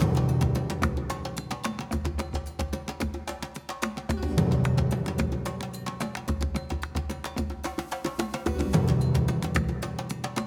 forest percussion loop; Shu; ps vita ps4 switch windows

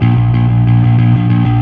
Fuzz A sharp 5

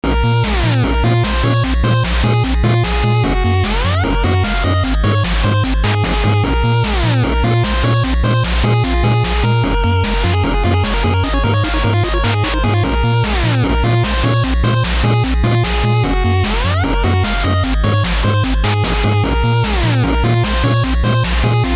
8bit; D.U.C.K.; 8bit speed

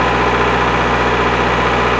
bulldozer engine; Spanish

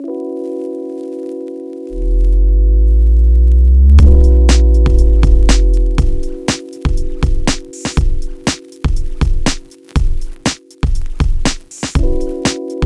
Lo-Fight (Instrumental)

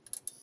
Light Flicker; The Joy of Creation Halloween

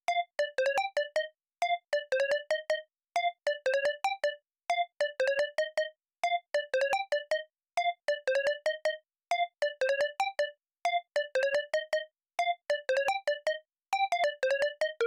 Melody; chippy

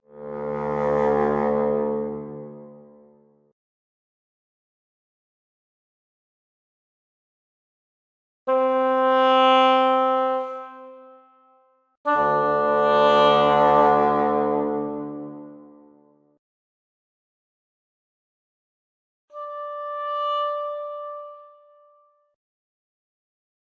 Camp Wind; Torchlight III; PS4

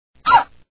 Rabbit Hurt; Sonic Smash Brothers Flash Online

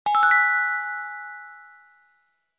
item Get

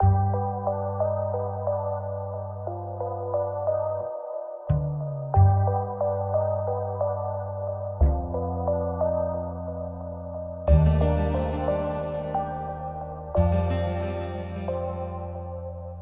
Mood Farewell Medium

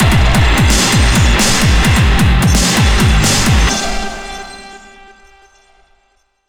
Action Boss; PunkGirl

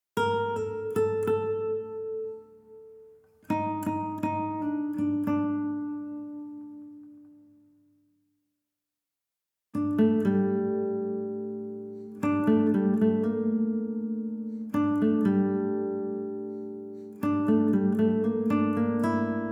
Savannah Music

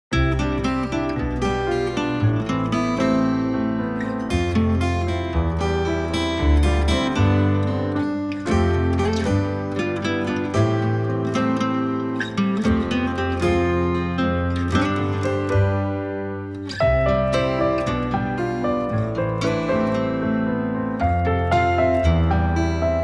BGM Season Spring; tokimeki memorial girls side 4th heart

